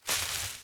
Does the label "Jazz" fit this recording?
No